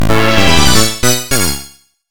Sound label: 1UP!; sonic rush original sound version